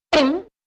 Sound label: byoink